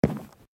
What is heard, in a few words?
wood, step; minecraft pe 0.6.1